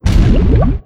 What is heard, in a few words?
Dmg Die; Greemong; Fly For Insanity